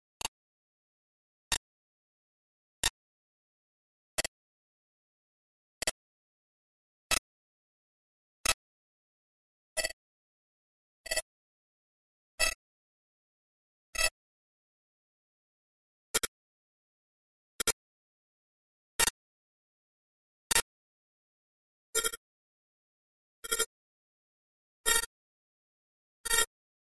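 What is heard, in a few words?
series of interference like signal interruption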